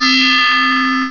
Windbell; HyperComposer DEMO

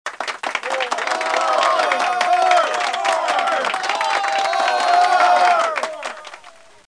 more, crowds chants; KABOOM! - Wild and Wacky Sound Software

